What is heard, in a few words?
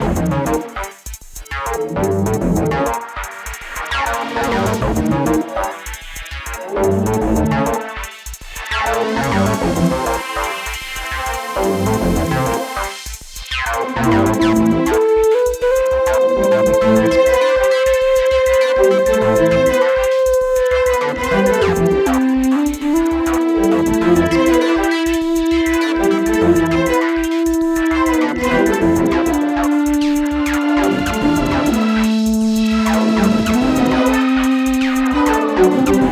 normal stripefilter 100bpm